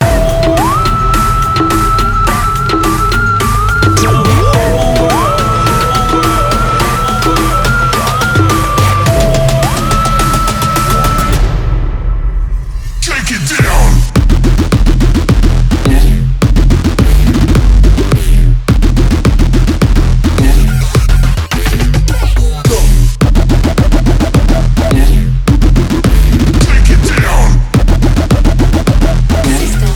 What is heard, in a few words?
Bgm Ringtone; Vikram Sandhanam